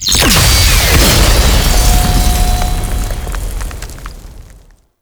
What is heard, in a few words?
Prime Blast C; World Seeder Descent